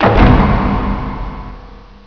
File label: fireball hit; valve; dbkillbox